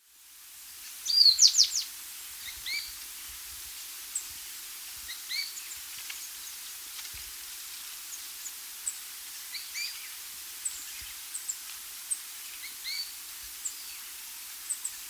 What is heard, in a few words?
Rufous-Naped Brush-Finch (Atlapetes Rufinucha), Andean Birds; S. America